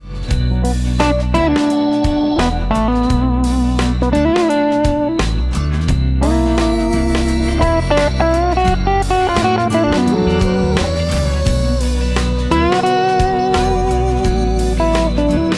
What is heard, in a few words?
Gibson ES-335